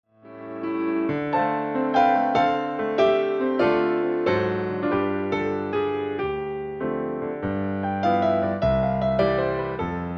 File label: swing